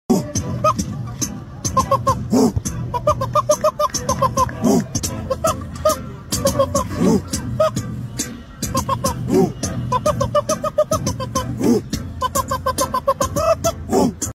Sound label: Chicken Cluck Beat